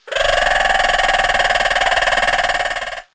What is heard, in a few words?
bird green; minecraft; technic